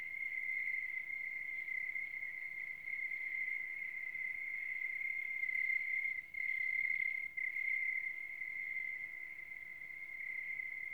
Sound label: Night Insects Loop